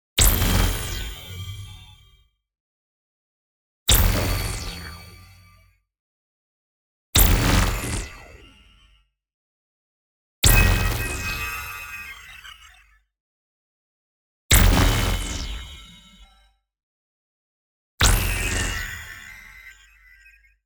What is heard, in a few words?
PING Lights Out; boom library; Neon